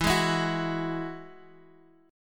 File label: Gb 7th Suspended 2nd E